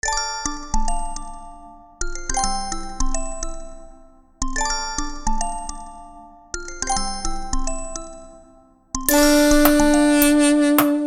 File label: analog orchestra